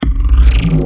bass purge

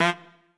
Trumpet melo

